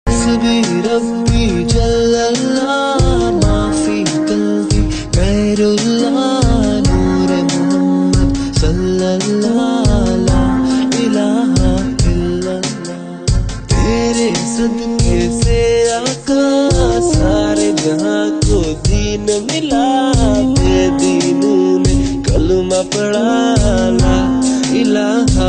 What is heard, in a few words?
Islamic Ringtone